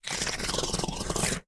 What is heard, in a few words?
leech mumble